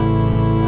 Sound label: quiet hum motor; astrorally